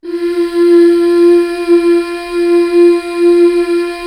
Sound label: MMHH, BACK. MMMHH Fem